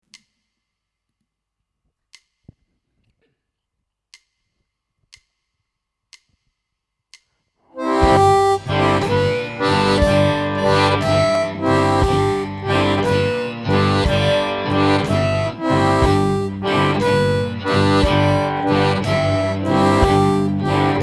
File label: Shuffle Boogie 140; Медленный пример; одиночными нотами при помощи техники блокировки языком